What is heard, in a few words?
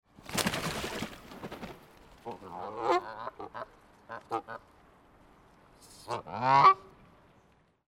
goose jumping out of water scared hissing flapping wings